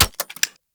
Shot Mech, Weapons; Acceltra Prime

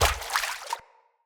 Step Water, Footsteps; Enemy; Corrupted Sproutfolk

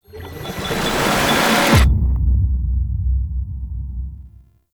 Elevator Stinger; Orbital Station; Warframe; Tau

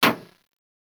Weapon Hit Effect Metal; Warframe; Caliber Chicks Minigame; Weapons; Hit Effects

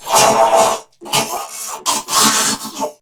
Death; Warframe; Corpus Crewmen; Crewman C; Dialog Barks Battle

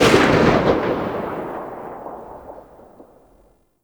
AK47 Tail; Warframe; Tenno

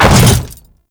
Hammer Boss Land Default, Footsteps; Warframe; Grineer Hammer Boss

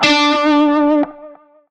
Shawzin Flourish Oct Three D; Music; Warframe; Narmer Shawzin

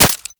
Lato Mech; weapons; Warframe

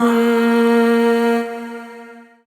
Void Shawzin Oct One A Sharp; Warframe; Zariman

